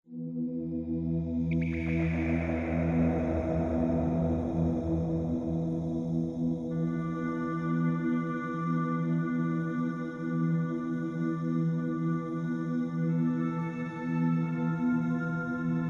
Amb Sound Scape Echoing Harpsichord, Oboe, Ambience; Ethnic; Ambient SoundScapes